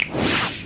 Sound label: zap